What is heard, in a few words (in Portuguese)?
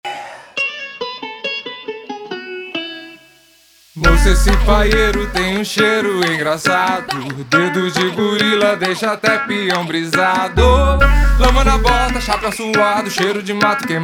Galopada